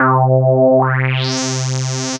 sweep

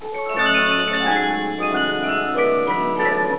musicbox

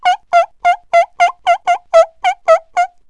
squeak; Wired for Sound Pro CD; Aristosoft, Inc.

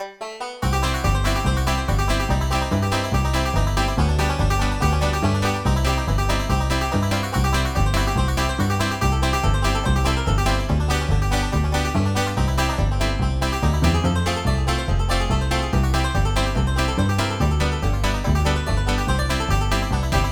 PICKIN; Multimedia Toolkit

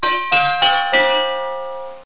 WARNING; WinU

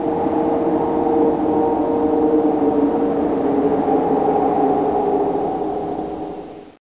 Wind Metal

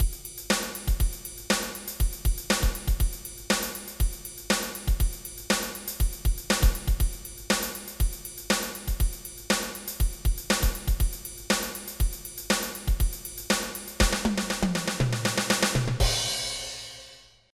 2 HND FUNK

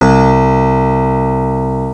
piano c2; Hexen Demo; Hexen Music; Hexen 6vox

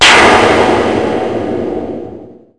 zombies appear; Hellboy; CD Expert nº 41

